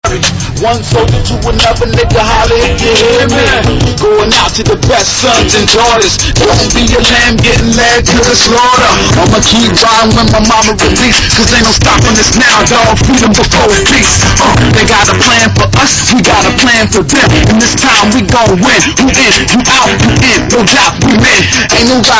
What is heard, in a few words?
hiphop